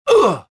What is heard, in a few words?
gasp